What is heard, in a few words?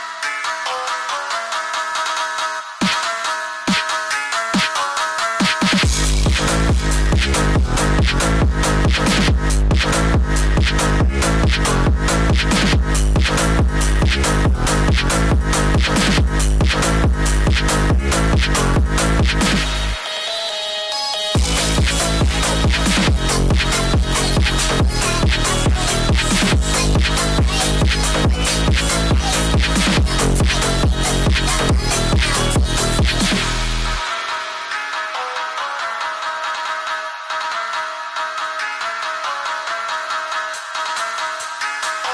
Trollge Phonk Sped Up